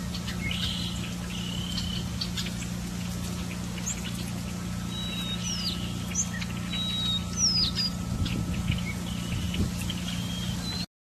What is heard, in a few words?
woodpeckers